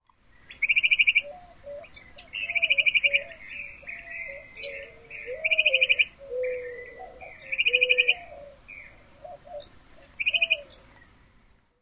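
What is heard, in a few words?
Ferruginous Pygmy-Owl female call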